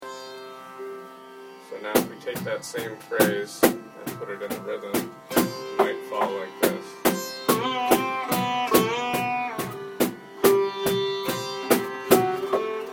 key phrases in teen tal

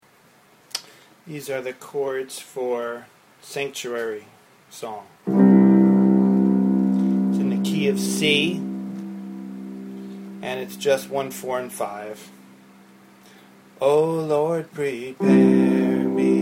chords

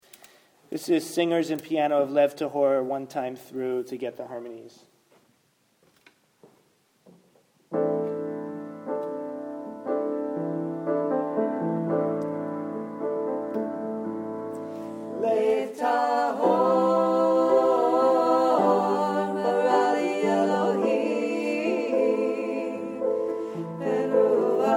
singer harmonies; lev tahor

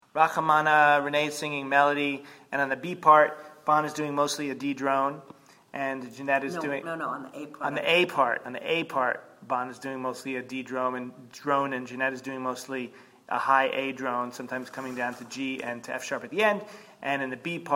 rakhamana vocal

